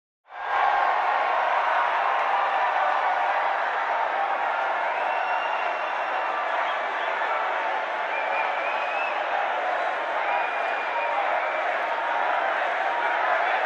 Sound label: Crowd cheering